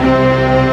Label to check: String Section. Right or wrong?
Right